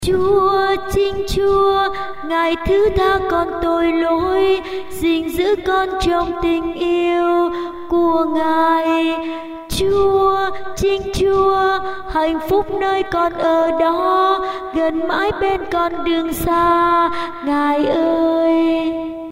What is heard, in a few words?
tenor basso